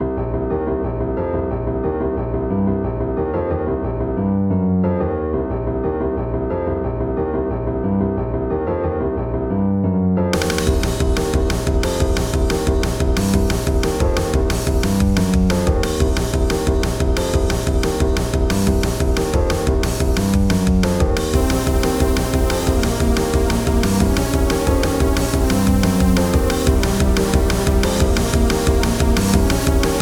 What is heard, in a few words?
Battle; ハロウィン曲のつもりのなんちゃってオーケストラ曲。